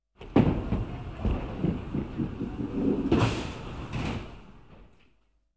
Bowling Gutter Ball; KMP Film Video Sound Effects

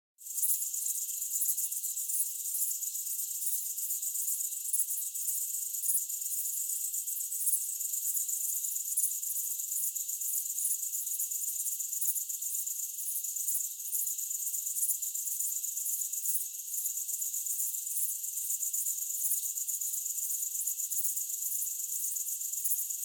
Cricket Ambience Night; Hollywood Edge; sound designer's toolkit v2

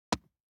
DICE on Neoprene, Drop, Standard, 3 Three Dice; Samples; ArticulatedSounds; DICE Sfx Party (Extended)

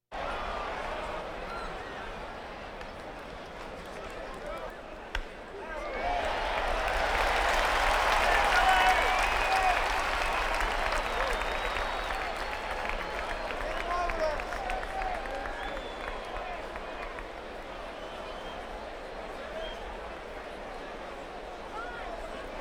Crowds Race cars Baseball

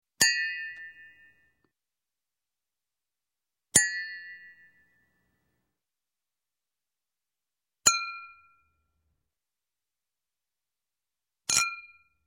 Glass, Clinking Crystal Glasses Clinking, Wine; Sound Ideas 2000 Series

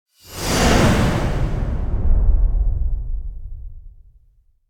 Impact Heavy Thin Metal Impact - Whoosh; Hollywood Edge; sound designer's toolkit v2

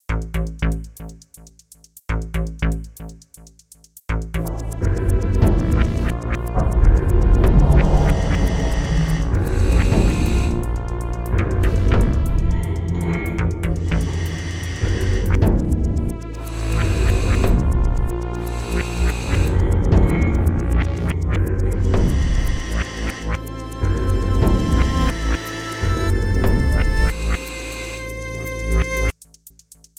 Last Battle

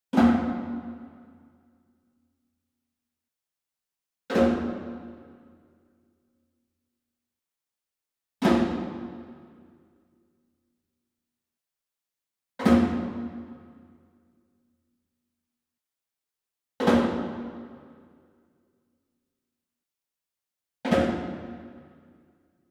WOOD PERCUSSION Wood Drum Floor Flam Slap Far; boom library; Cinematic Strikes Construction Kit

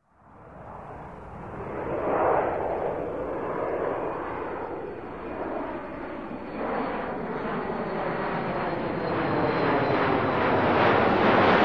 Large Jet Take Off; Sound Ideas; Series 2000

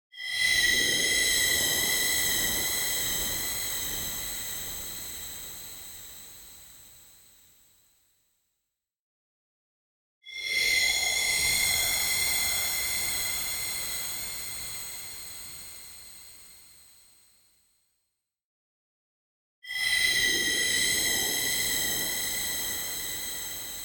SHIMMER Crystal Air; boom library; Anime Essentials; DSGNMisc

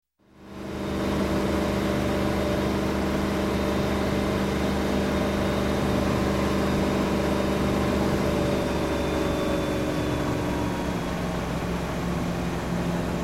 Boat Inboard - Cruising Along, Slow Down; Sound Ideas; Series 2000

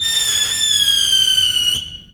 firework single; best service Blue Box

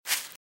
Velociraptor - Footstep - Grass - Run - Right

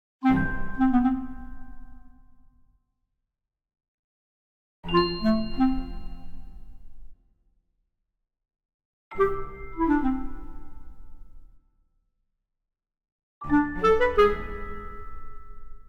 Orchestral Jingle Neutral; boom library; The Interface

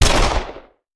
Machine Gun; Sound Ideas; Guns

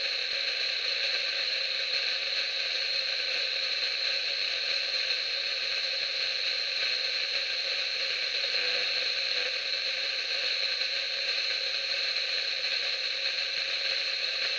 walkie-talkie static; devices accessories utilities; NS Studios - ultra sound collection

